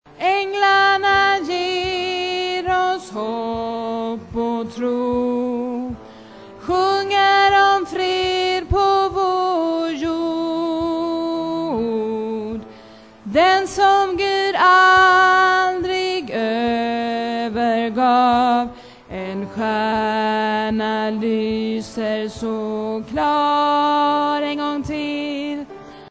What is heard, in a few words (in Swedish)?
bas